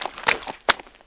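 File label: footsteps; Mafie 1 cz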